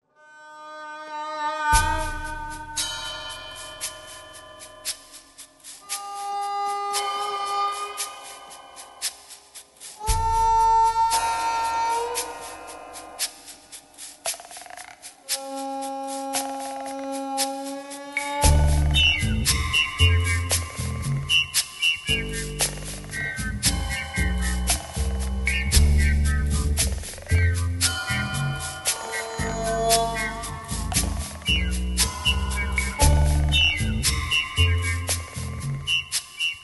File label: zither